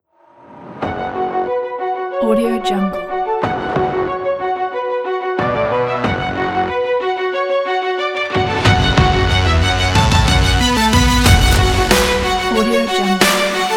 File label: dramatic action sport adventure intro